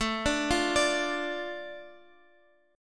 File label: A Suspended 4th Sharp 5th, Guitar Open F; Asus4#5; arpeggiated